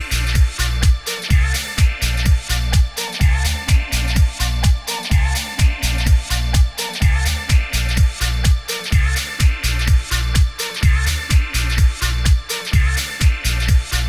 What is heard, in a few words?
string